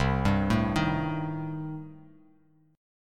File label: C Minor 6th; Cm6 arpeggiated; JGuitar